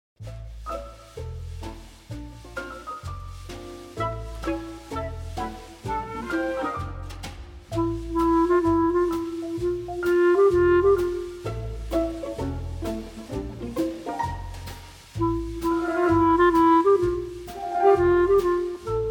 clarinette; brique formidable; Be-bopéra; une enquête de John Chatterton

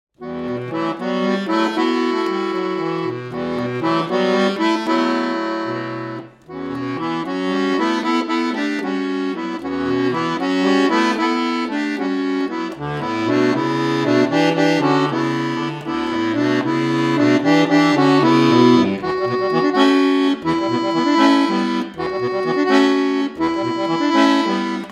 instrumentale

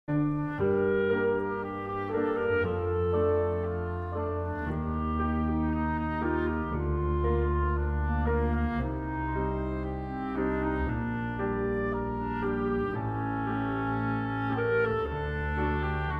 mineur; baleine casse-cou

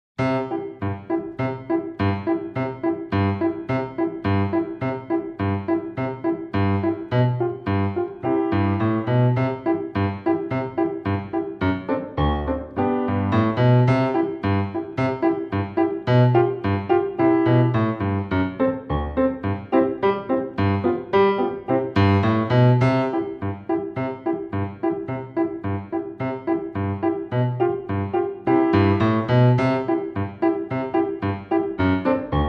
instrumentale

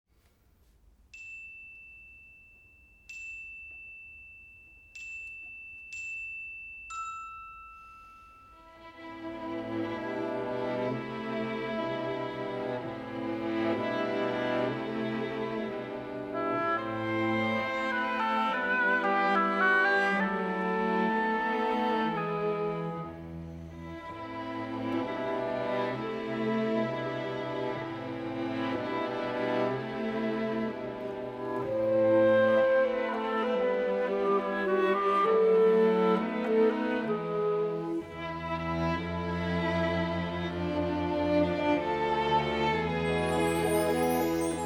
orchestre; petit prince

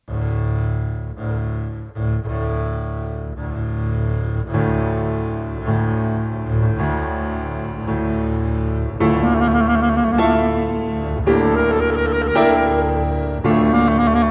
instrumental

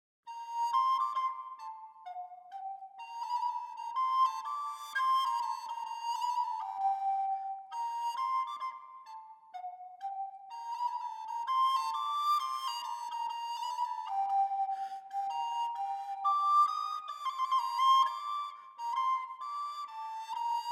baroque flute bec aigu; airs sérieux et à boire

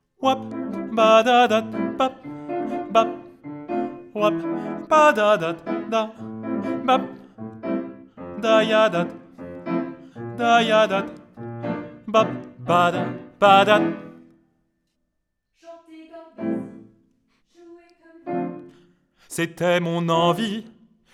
Baryton; Enregistrement baryton; Solstices

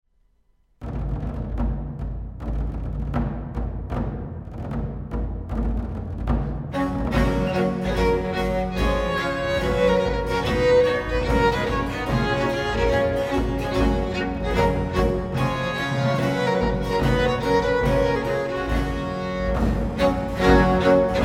orchestre; te deum

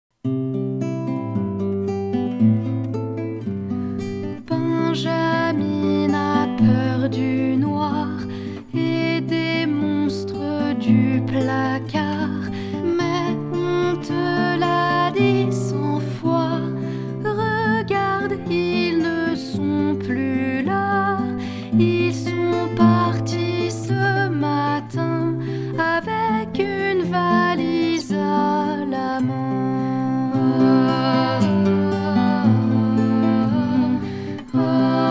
comptine

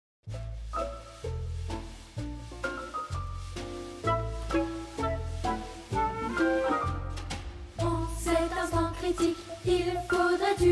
chant

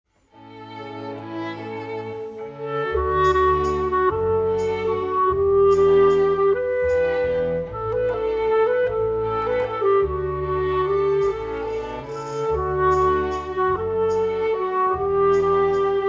clarinette; petit prince; planète libre

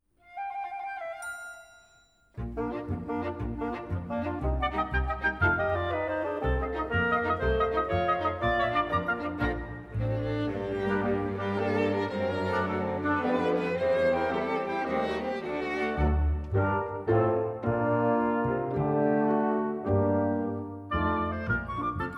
pour chanter a lopera instrumental

